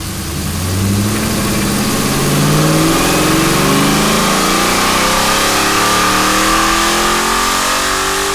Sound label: ENGINE ACCEL